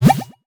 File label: Bonus